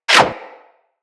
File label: Bullet Parry Metal; Impacts; Bullet Parries